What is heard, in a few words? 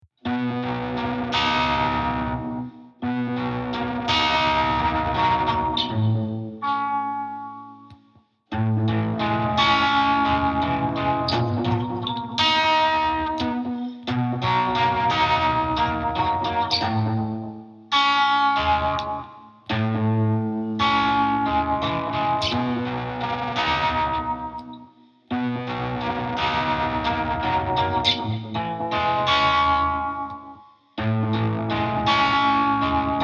riff